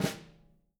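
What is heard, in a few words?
jazz snares; Sampleheads; Peter Erskine Living Drums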